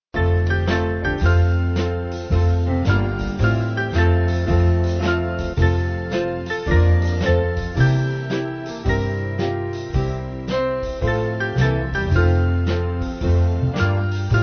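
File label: Spiritual, Band, Music Backing; There Is A Balm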